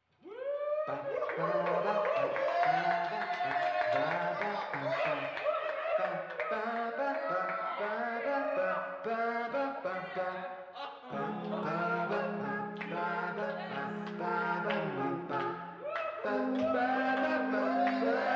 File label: Jazz Club